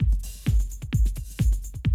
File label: 130, Classic DrumLoops